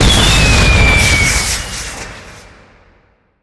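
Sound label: fireworks launch; ambient; Left 4 Dead 2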